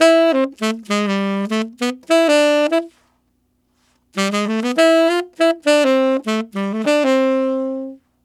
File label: Tenor RnB in C maj, Tenor Sax Loops, Jazz; Sonic Foundry (Sony Creative Software); Jazz Solos and Sections